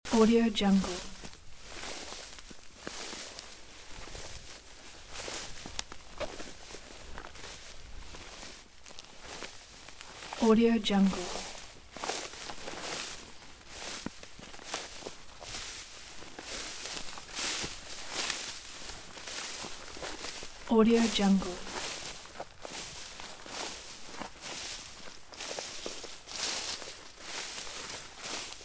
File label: walking through tall grass and weeds loop